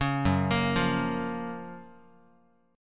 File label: F sharp Major 7th, Guitar; F#M7 Chord; arpeggiated; 4,2,1,3,x,x